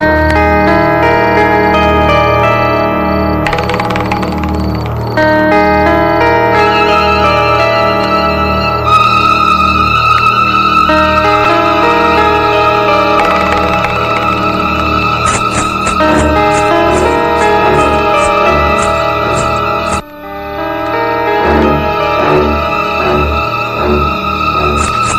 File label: malayalam ringtone, bollywood ringtones